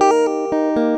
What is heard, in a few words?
guitar; TRUTH-HZ; Crowdsourced Sample Pack